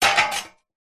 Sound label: industrial sack truck trolley metal impact concrete